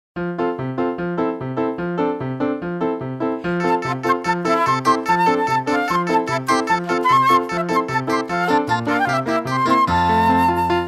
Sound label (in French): Gare au loup instru